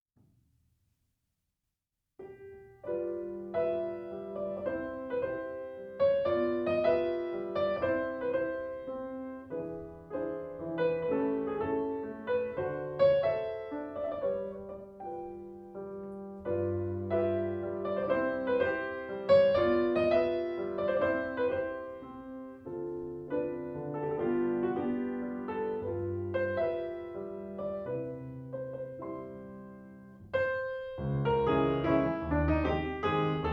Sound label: Mazurka op. 33,3; F. Chopin